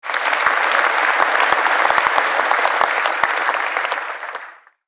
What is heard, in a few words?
Crowd applause medium response